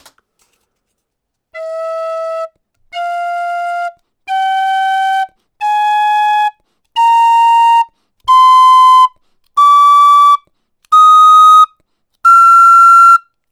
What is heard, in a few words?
scale